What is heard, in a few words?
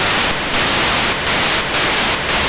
9k6 packets; BRICSAT